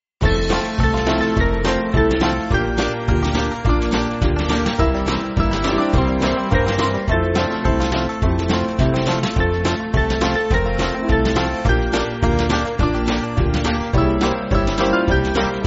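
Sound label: Band, Backing Files for Churches; Speak Just A Word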